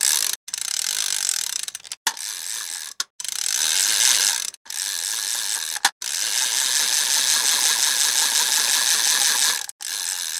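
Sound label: fishing rod reel, spinning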